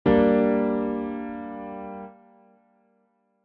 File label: Gm triad